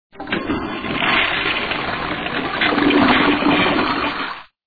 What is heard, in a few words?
flush